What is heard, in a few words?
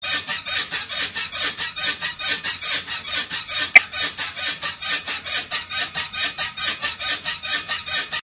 squeaky bed; M&M Show